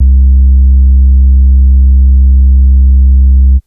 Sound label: bass 2, from my moog gm; And bass 2 as a bonus :wink: (thats from my moog gm)